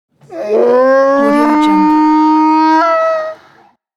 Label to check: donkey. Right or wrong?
Right